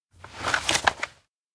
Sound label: timecapsule oldnote pick; anemoi